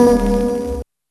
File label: EP fog; E-MU Formula 4000 Series Vol. 1; Hip Hop Nation